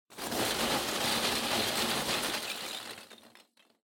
Loose Fill Packaging, Rustle; 梱包材

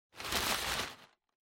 Packing Paper Rustle; 梱包紙01-02(ガサガサ); 効果音＞梱包材

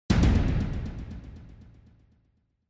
Onoma Impact

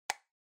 Music Box Foley Close

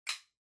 Lighter (Far-Close)

